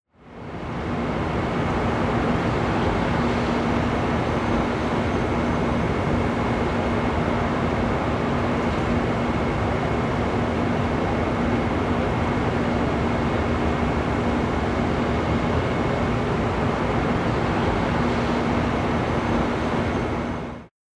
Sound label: Cities nyc Traffic